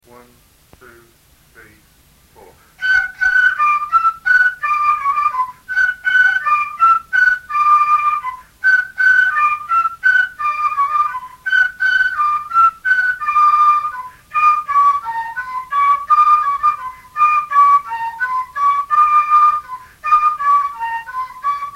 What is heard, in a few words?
fifer